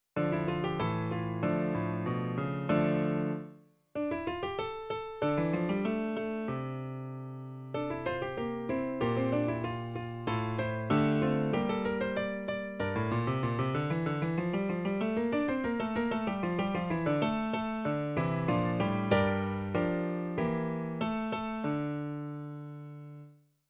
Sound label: fast singing, no voice